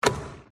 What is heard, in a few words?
Ball Sound Tight